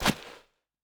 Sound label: Footstep Sand; Opsive; UltimateCharacterController